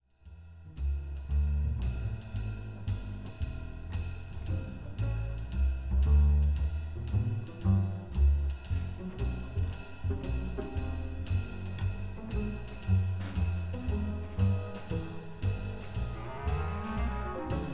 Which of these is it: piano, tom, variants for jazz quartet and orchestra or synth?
variants for jazz quartet and orchestra